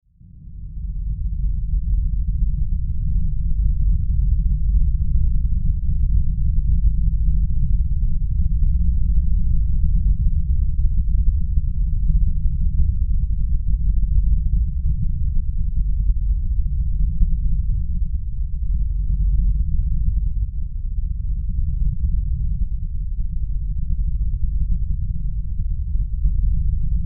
Drone Rumble Reverb Low; Blastwave

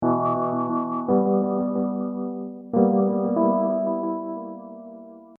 Hip Hop Dark Rhodes Licks 90 Bpm Straight E

